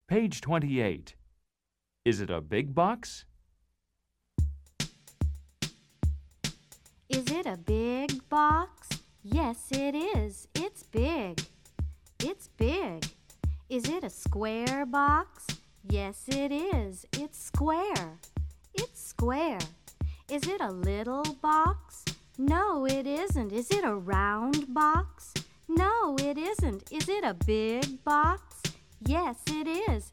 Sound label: Is It A Big Box (Solo); Let's Chant, Let's Sing 1